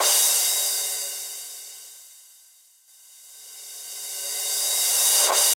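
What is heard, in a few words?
drum crash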